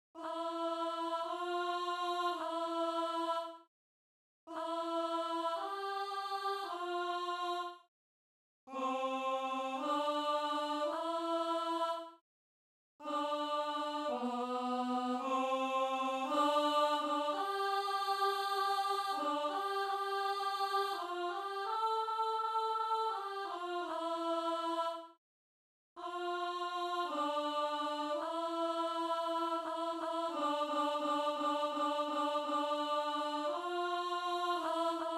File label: Alto backing practice track